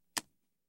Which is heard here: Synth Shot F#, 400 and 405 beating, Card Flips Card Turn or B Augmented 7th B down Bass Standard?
Card Flips Card Turn